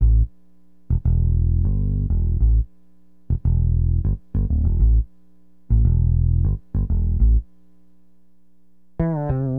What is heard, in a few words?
Bass, Acid Jazz Funk; LiveAct Pool 1